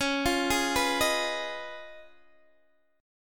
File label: Db 9th Db down Guitar DADGAD; arpeggiated